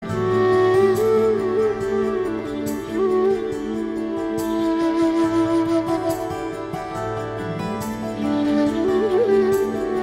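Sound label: flute background music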